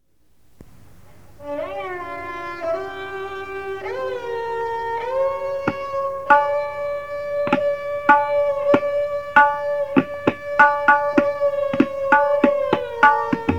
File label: tabla solo; 1971; Uthan; Kermatullah Khan